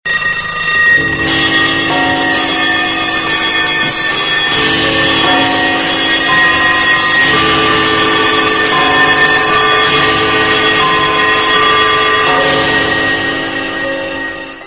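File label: alarm clock, klingel sms töne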